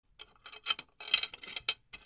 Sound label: BATHROOM